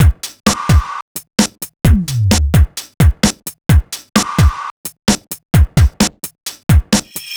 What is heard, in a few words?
130 Pop Drums, Pop Rock, Drums, Very Fast Tempo; Pro Tools Essential Loops; Digidesign